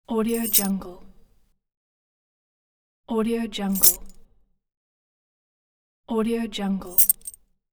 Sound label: keys on keyring